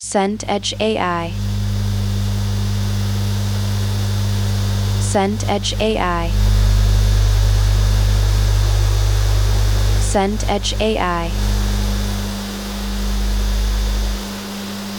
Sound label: ambience street